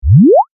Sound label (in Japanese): 短い音 フワ